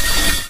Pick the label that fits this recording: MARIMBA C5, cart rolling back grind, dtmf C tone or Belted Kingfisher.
cart rolling back grind